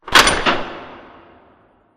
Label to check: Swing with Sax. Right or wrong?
Wrong